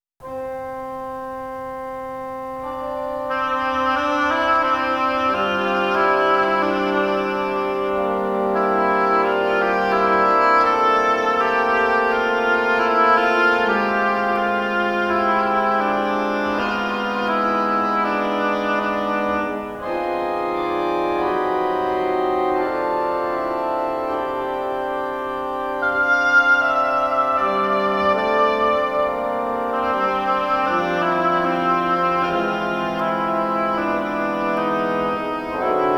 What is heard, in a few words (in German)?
Variationen - Oboe; Variationen über Der Mond ist aufgegangen